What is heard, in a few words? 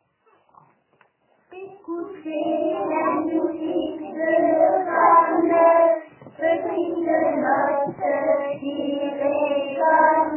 Vocal